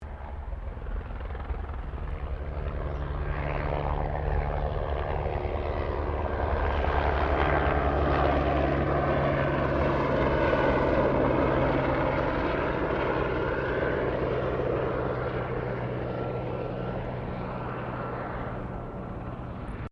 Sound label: helicopter Bell 204 firefighter passing medium; Helicopters; Vehicles